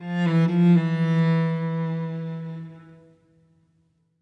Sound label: Music Level Argument Stinger; Krish